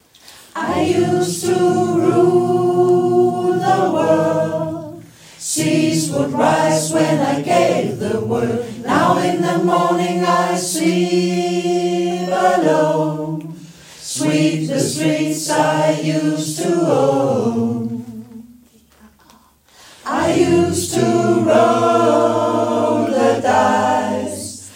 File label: acapella